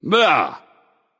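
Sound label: tongue, non-verbal; smite; voicepack; merlin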